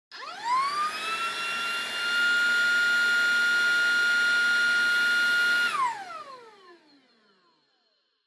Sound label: Vacuum Cleaner Vacuum High Mid